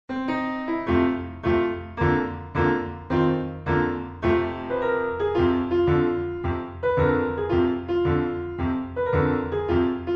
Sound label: Stomp Boogie